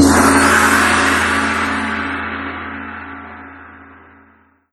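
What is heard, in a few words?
cymbal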